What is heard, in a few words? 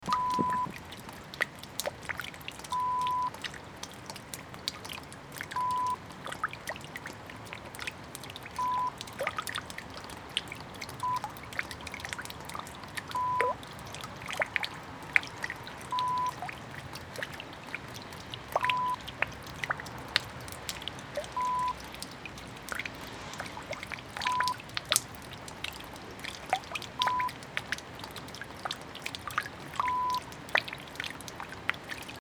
Water Drip Onto Butt